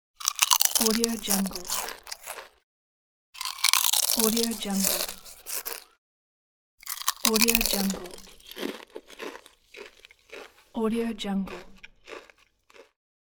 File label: chewing potatos